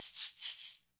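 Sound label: classic hip hop shaker; Classic Hip Hop Mega Kit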